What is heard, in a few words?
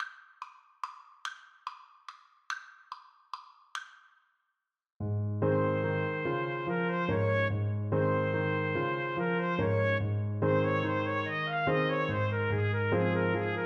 trumpet duet